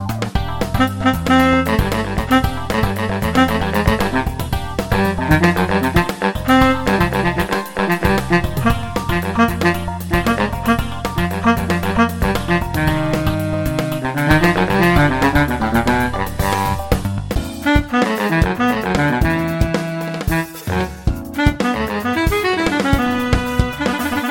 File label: baritone saxophone